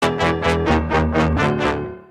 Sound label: duel reject; gmod; darkrp